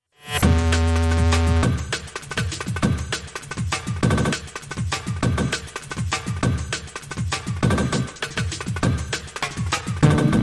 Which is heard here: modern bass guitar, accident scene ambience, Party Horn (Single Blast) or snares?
modern bass guitar